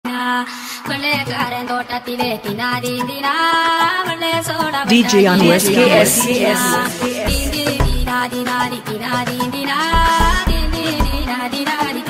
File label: dj remix tamil ringtone